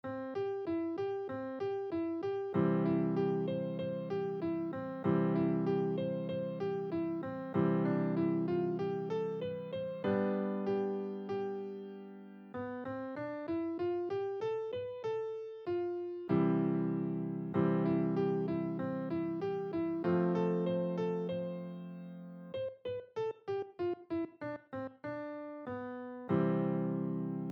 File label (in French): Des Gammes et des Arpèges Piano Débutant 96bpm; Les Aristochats